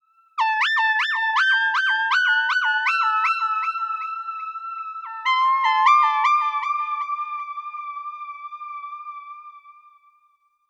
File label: Pop Moog Line A; Pop Rock; Pro Tools Essential Demos; Digidesign; Essential Loop Demos; Demo Session - Pop Rock